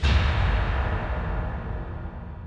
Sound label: Tymp